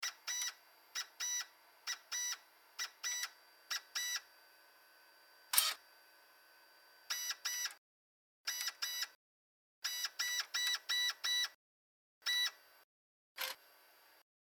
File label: morse